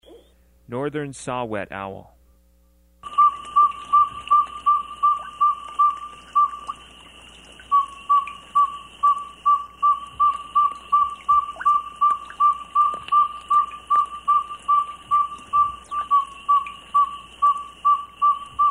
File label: Northern Saw whet Owl